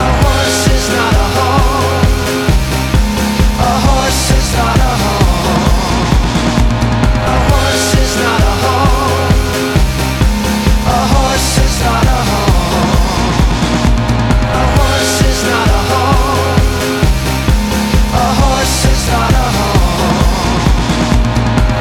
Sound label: ringtone